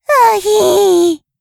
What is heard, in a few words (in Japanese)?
あひぃ～, ASMR